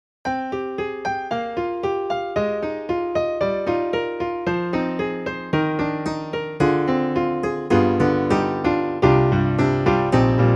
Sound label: rock piano; EDIROL; Super Quartet